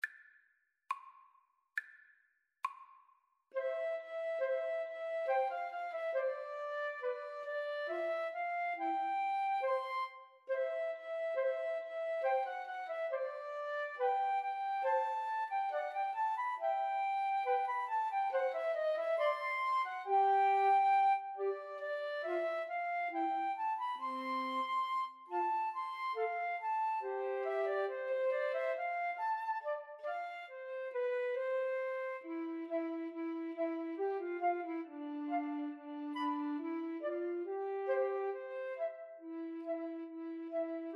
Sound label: schubert rose, Flute Trio